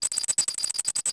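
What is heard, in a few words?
binoculars seeker Beep loop; interface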